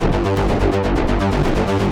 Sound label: G1, SynthLine; 90 s Sample CDs; NiceBeats; SynthLine VOL-20